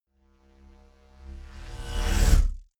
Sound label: sci-fi vehicle drive by buzz; raumschiff